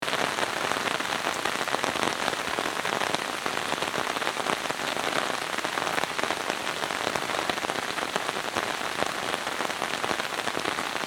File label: Heavy rain on umbrella thunderstorm underneath loopable